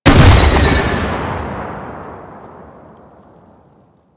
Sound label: Proj Explosion; Warframe; Weapons; Cephalon; Ceph Primary